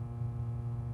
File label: room interior; Soldier Of Fortune II Double Helix; XBOX; ambience